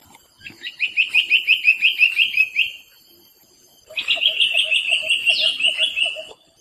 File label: Large Woodshrike calls; Amar